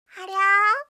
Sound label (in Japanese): haryaa